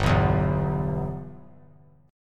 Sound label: G 6th; G6 strummed